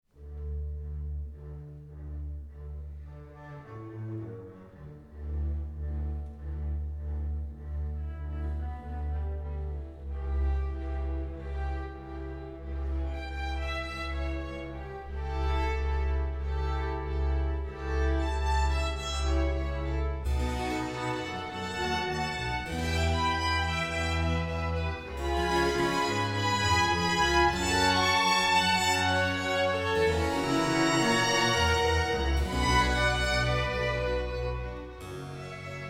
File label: Sonata in E flat major RV 130 for two violins, viola and basso; basso continuo; al Santo Sepolcro